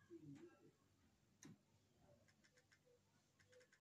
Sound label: Drums